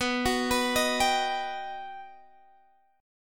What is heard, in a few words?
G Augmented Major 7th